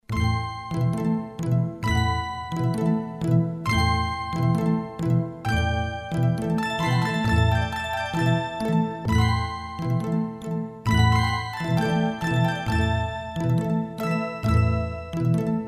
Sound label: Quints